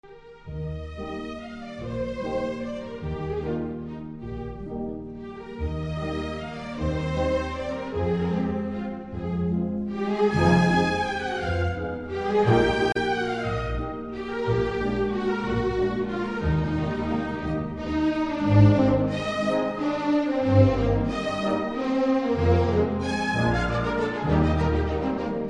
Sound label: Valse; Coppelia; Delibes